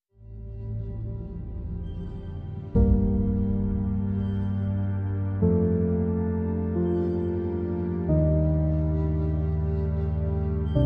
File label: Dark Sombre Drone